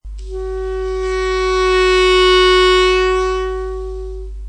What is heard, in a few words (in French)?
sol, clarinet